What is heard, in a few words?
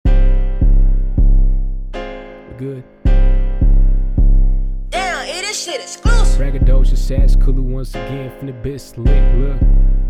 Beatbox Freestyle